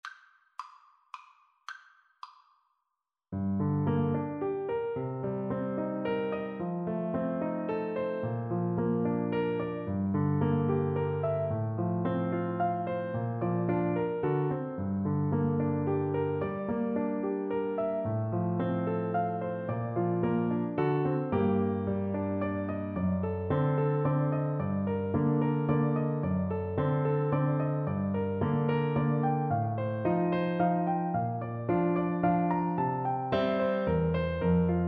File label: Clarinet